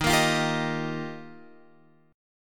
Eb Major Add 9th, Guitar Open F; Ebadd9 strummed; x,10,10,12,10,10; JGuitar

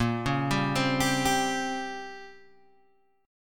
Bb Minor 6th Add 9th Bb down Guitar Open G

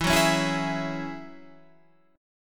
F sharp 7th Suspended 2nd E

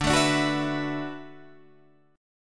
D 6th Add 9th D, Guitar, Open D

